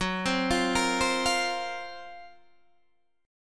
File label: Gb Major 7th Flat 5th Guitar DADGAD